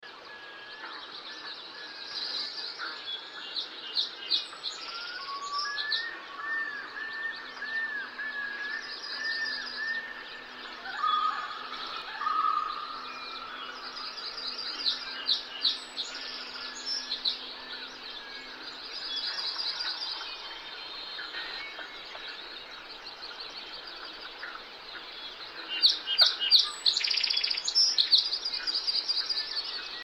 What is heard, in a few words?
forests lake loons and birds